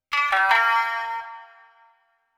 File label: ETHNO 160 C